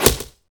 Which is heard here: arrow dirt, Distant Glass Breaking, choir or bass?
arrow dirt